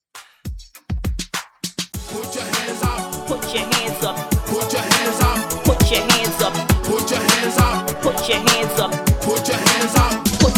Throwback ReDrum 101 BPM

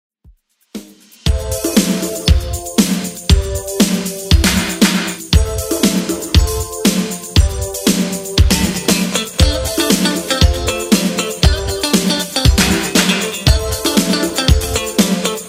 80s Redrum, 118 bpm

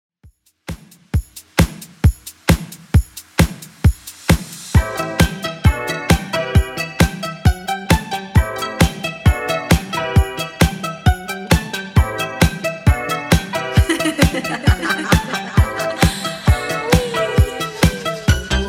80s Redrum 133 bpm

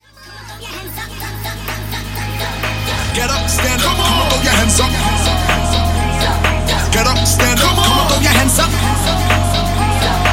Mashups; Remixfreakz

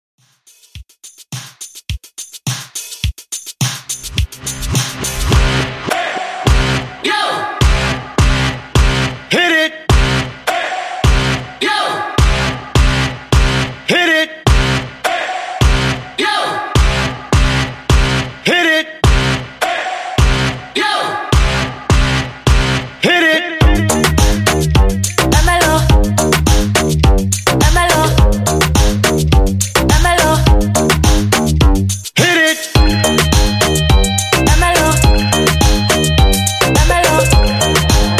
Mixshow Redrum 105Bpm

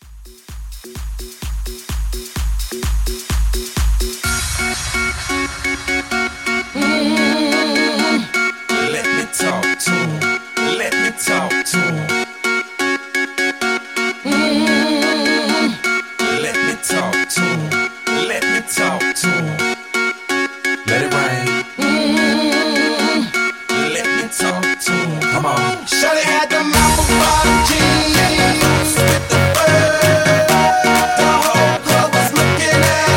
Mashup 128 Clean

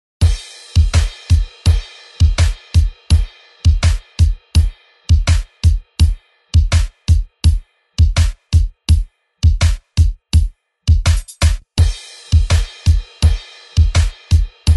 Reggae; DJ Mhark; ReDrum

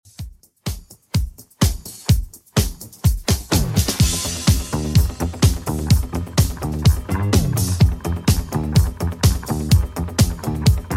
70s Redrum 126bpm

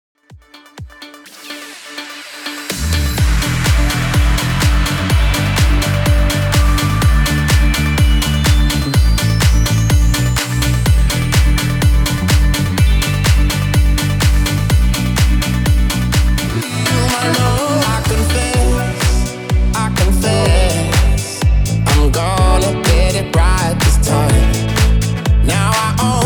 125 BPM CLEAN

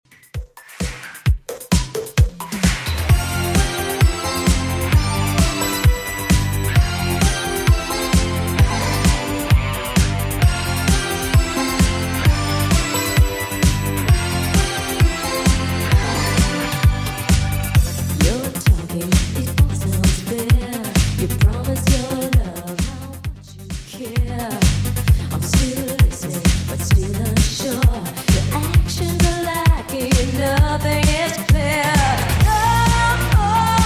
80s Redrum 131bpm

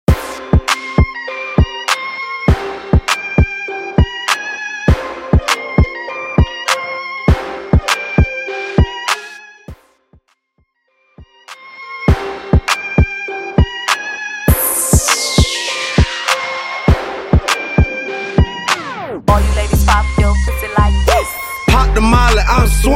Hip Hop Rap Mainstream Hype; Vegas Edit

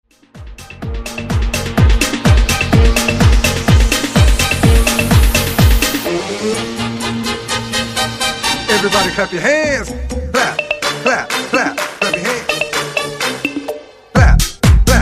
2000s Tech House Bootleg

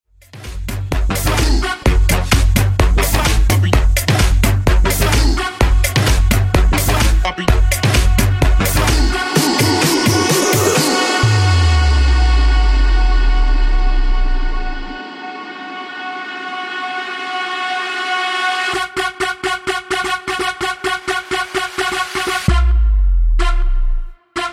Funk Toneplay 128bpm

